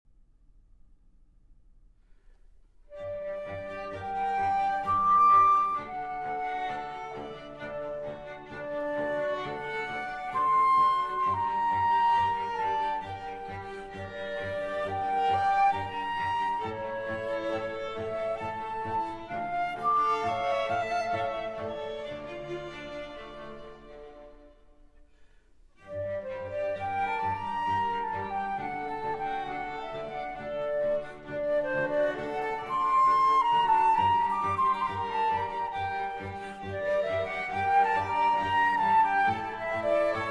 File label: Concerto in G Major For Flute & Strings